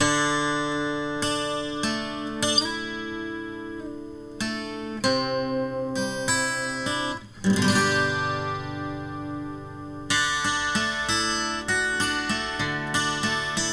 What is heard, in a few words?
karaoke